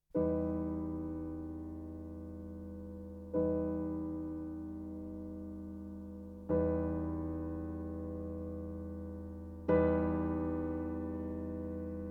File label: Fourty Chords